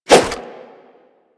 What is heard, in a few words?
Nunchaku End Whoosh; Warframe; Tenno